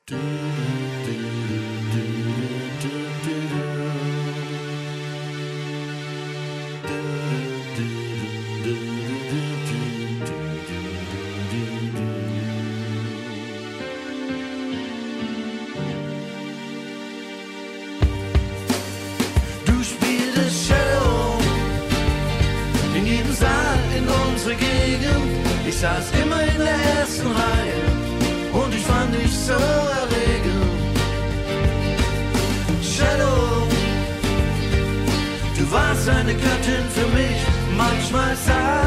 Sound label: Cello